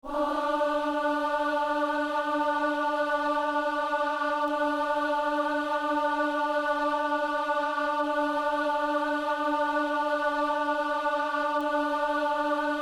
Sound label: alto